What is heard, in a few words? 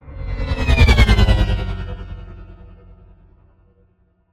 passby designed whoosh futuristic scifi airy synth spaceship fast; Sonniss GDC